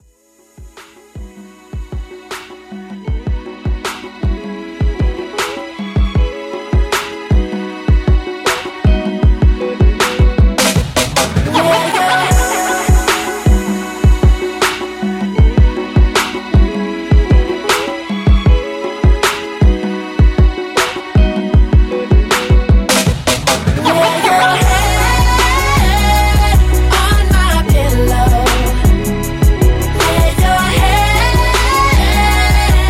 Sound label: Lay It Down Intro Outro 156Bpm